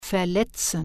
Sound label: hurt